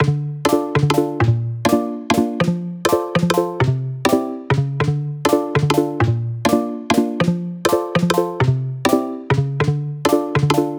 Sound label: Chord Loop - 100 BPM D Min; Synths; Loops; Cymatics; EDM Starter Pack